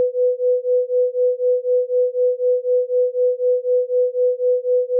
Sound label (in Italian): onde sinusoidali 496 500hz, battimenti